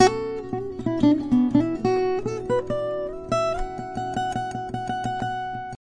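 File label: acoustic guitar, guitar; acgtr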